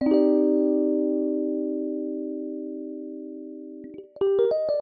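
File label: Soft Chord, клавишных funk мягкий аккорд; сэмпл клавишных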